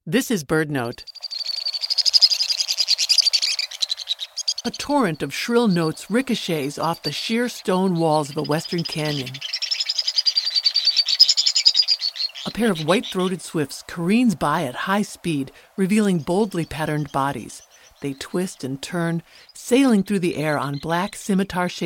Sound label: white throated swifts